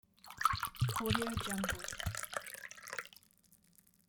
serving wine in a glass

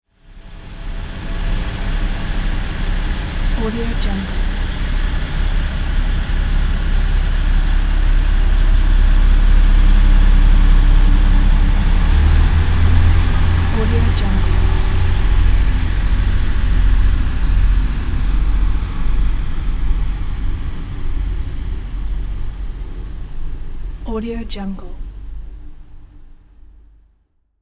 textural internal sound of a passenger train

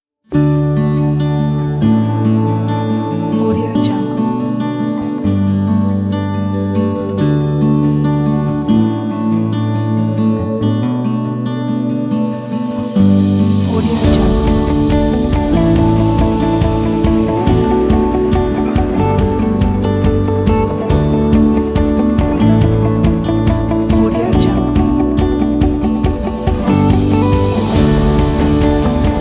an optimistic

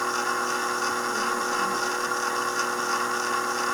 audio cassette player rewinding motor loop

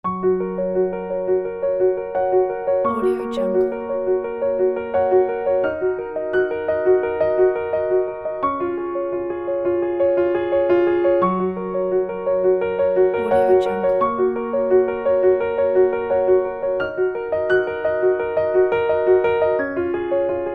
inspiration solo arpeggio piano background

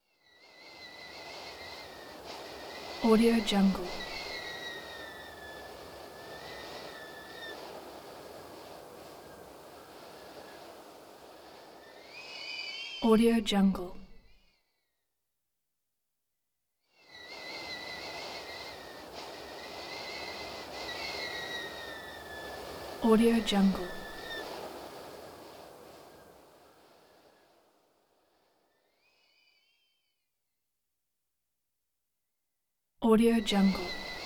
whistly bus wind soft bluster howl

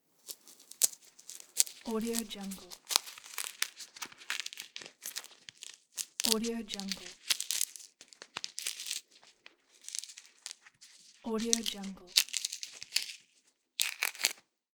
peeling orange fruit

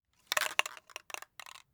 spray paint

